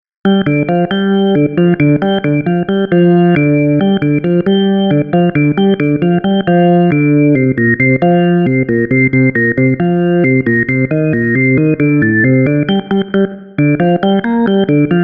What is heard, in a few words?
organ; HALion6 : Model C; Classic Rock 02